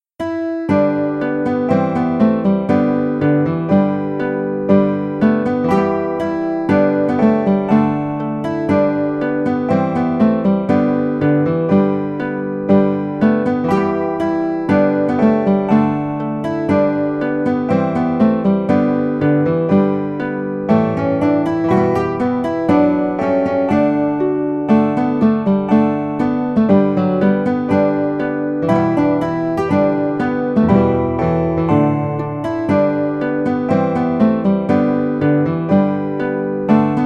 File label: Jeanie With The Light Brown Hair Guitar